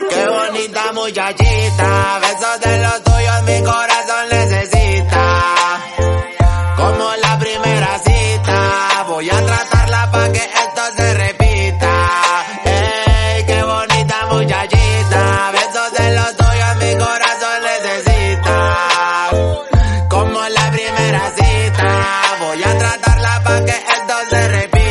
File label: spanish song